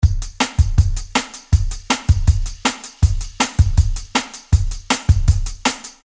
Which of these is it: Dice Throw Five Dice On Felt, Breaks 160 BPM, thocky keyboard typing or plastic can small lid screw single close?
Breaks 160 BPM